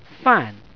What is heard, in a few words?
fan